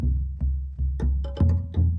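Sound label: Calm Far, music; from dust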